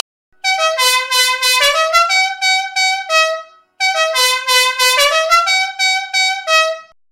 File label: dixieland; duke of hazzard